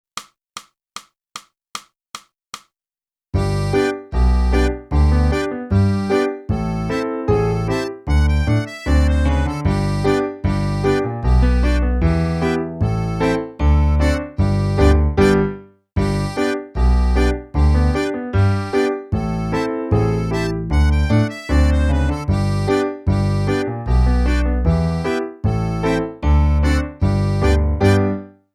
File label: flute, the steamboat